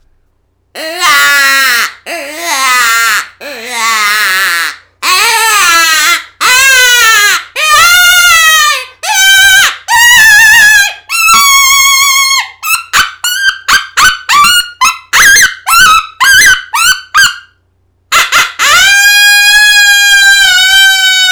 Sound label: Tone board; orion